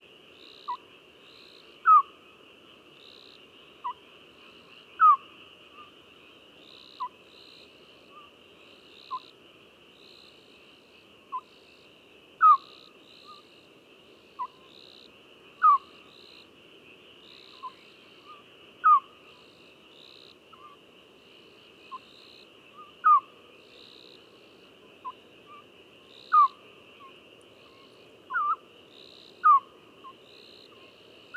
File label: Cyprus Scops Owl Hooting of male then soliciting calls hooting of female